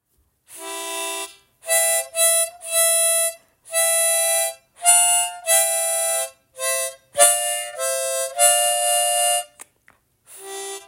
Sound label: Harmonica, Hush; voith usa